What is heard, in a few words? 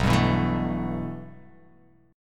B 7th Suspended 4th Sharp 5th